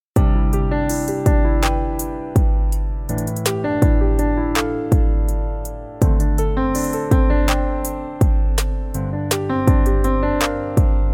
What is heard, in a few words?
Dream Piano; Itel itelOS 15